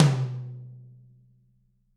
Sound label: TT, Toms; Bob Clearmountain Drums I